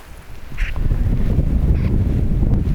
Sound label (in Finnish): kurpan lentoonlahtoaantely